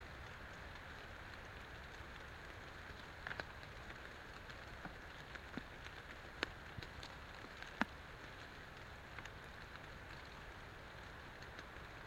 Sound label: yamanakako sound